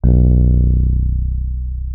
MK1F B0, RHODES; Xsample VOL-1

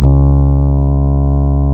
REED C#; Big Fish Audio; Synclav FM

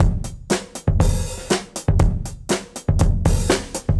Beat 120, dusty funk; musicradar; Beats; 120bpm

